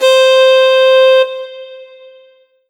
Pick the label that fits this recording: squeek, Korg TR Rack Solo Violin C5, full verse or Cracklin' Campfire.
Korg TR Rack Solo Violin C5